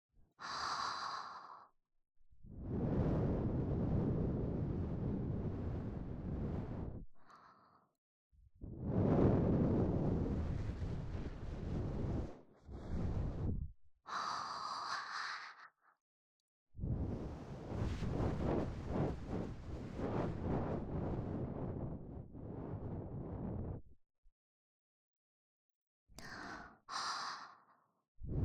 【左】耳ふー, ASMR; (元右)

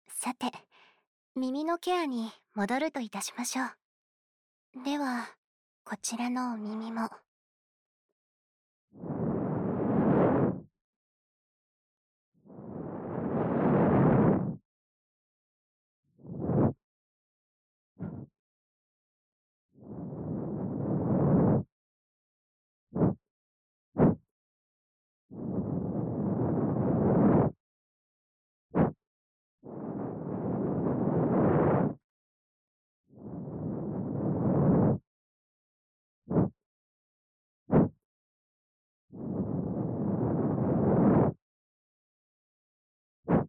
耳吹き右

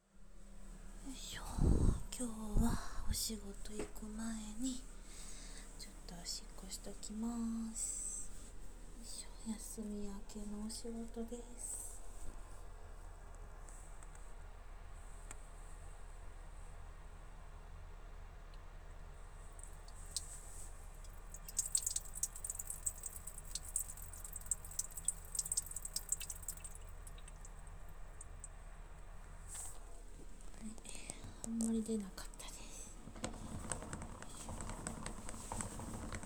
お家のトイレでオシッコ; ５日目・出金前の

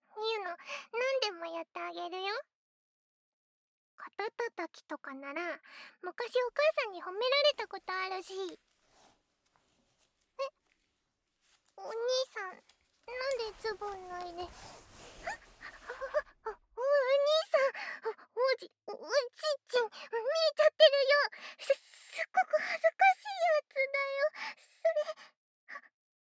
耳舐め手マン手コキ, ASMR

